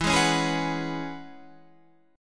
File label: E 6th Add 9th E, Guitar Standard; E6add9 strummed; JGuitar